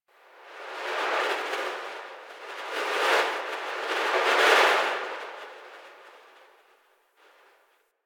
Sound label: Wind Gust, Ambience Plains